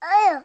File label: Hurt; MilkChoco